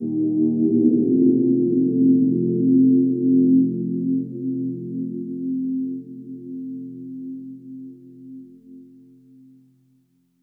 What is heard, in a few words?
gliding fx pad; Pads